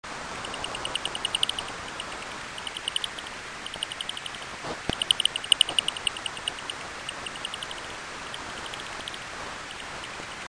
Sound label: zerocross ampl